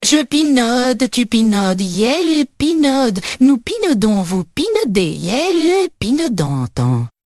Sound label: jingle